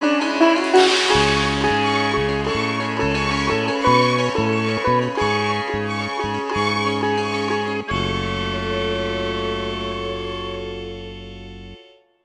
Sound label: Bari Sax